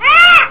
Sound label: parrot